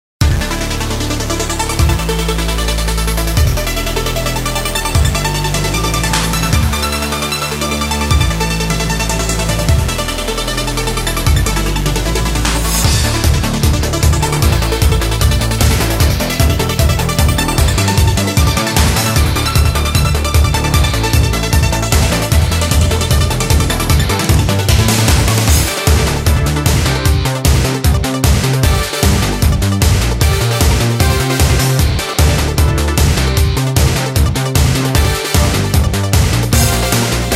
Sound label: karaoke; sdvx